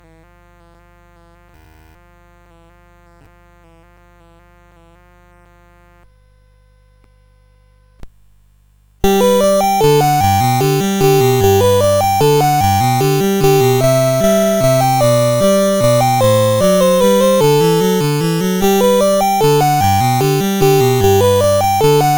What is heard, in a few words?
Minuet in G, CSG8580R5; Digger